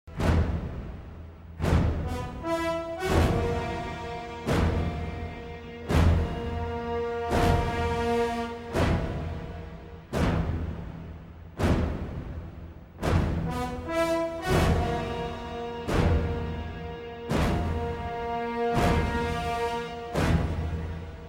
The Drums

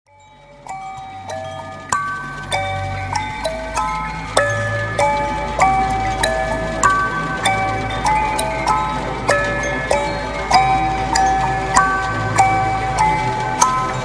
Deep Healing Hang Drum

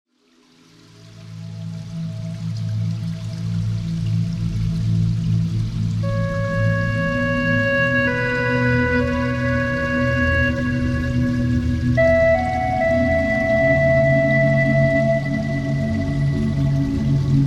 Hulusi Healing Water

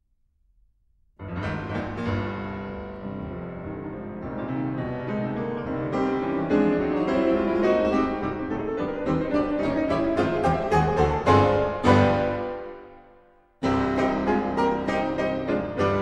Suite For 2 Pianos; Rachmaninoff; Op. 17; 4. Tarantella

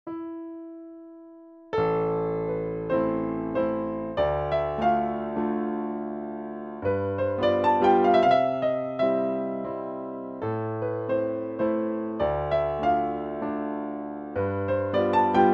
Waltz in A minor; B 150 Op. Posth

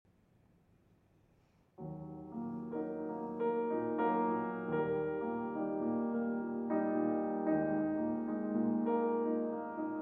Andante, Violin and Piano; Sonata in G Minor, Op. 19

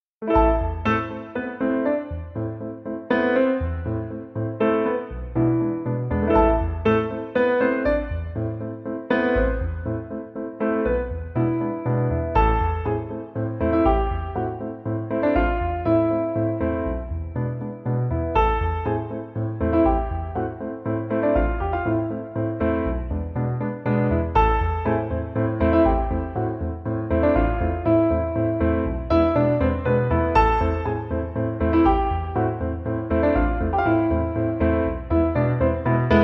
Piano Only

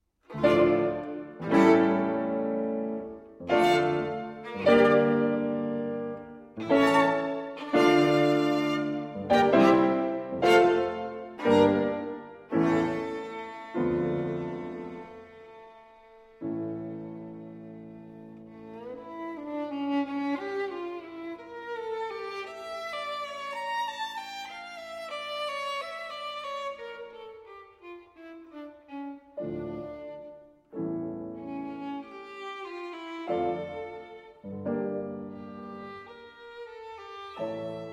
Violin Sonata in D Minor; Schumann; Violin Sonata No. 2; Op. 121

